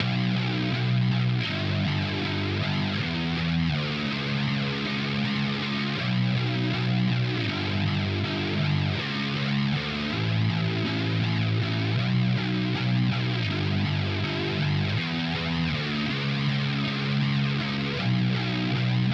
Distorted Bass, shoegaze

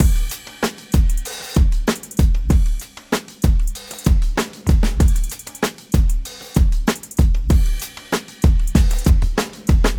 Full Drums, modern hip hop

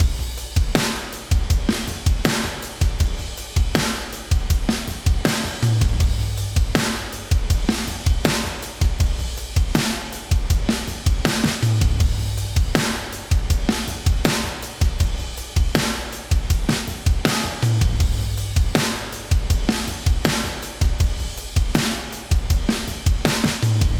Drums With Loops, shoegaze

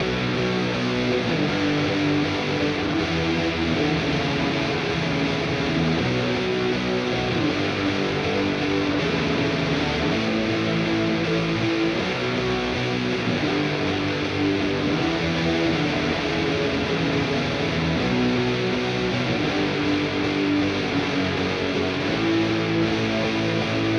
Rhythm Guitar Textures, shoegaze

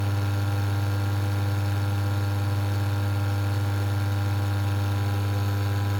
Humming

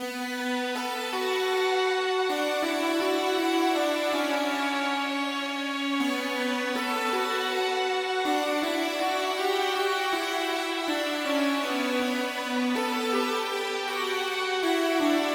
Synth Main Lead, shoegaze

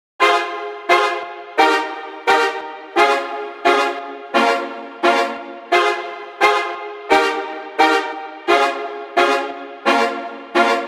Brass Chords, drum and bass

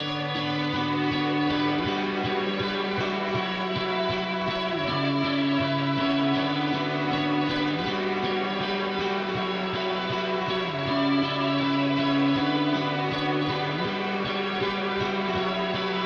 Background Lead Guitar, shoegaze

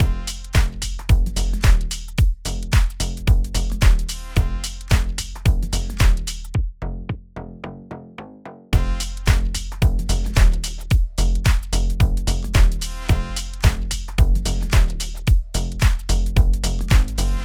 Brass Verse And Chorus, pop rap

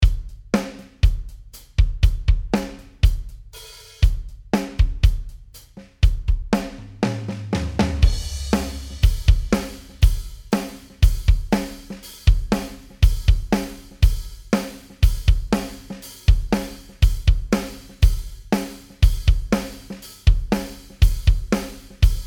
distortion tricks tip DRY reverb

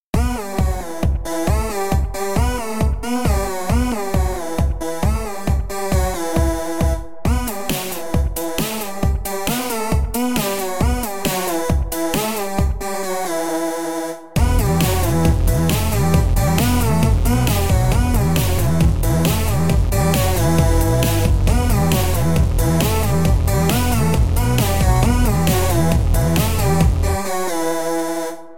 Smooth Lead Mono Delay

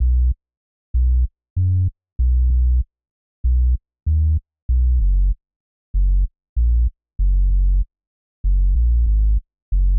sub bass; modern hip-hop

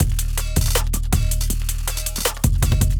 Drums Bus Processing, hyperpop beat

